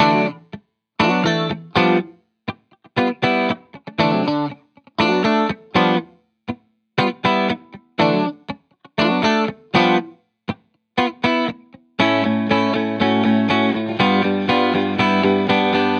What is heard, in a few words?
Guitar Bpm120 Key D Full, 70s Guitars Full Mixes; Fox Samples; Back to the 70s Guitars